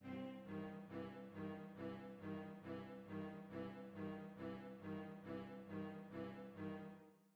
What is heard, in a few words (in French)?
ostinato en ut; concerto pour la main gauche